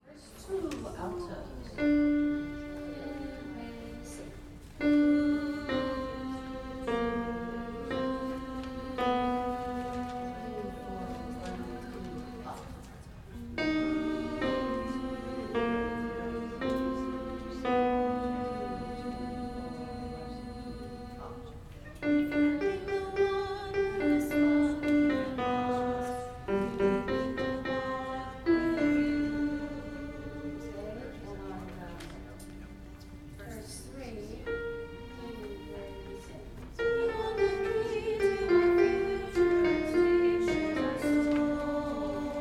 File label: alto; vs 2 and 3